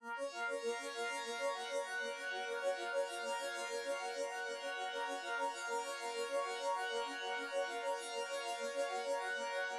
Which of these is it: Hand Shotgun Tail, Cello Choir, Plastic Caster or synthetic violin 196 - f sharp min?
synthetic violin 196 - f sharp min